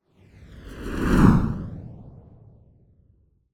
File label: Whoosh Passing Rocket By Whoosh; Hollywood Edge; sound designer's toolkit v2